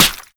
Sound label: flesh, bullet impacts; physics; Half Life Model Pack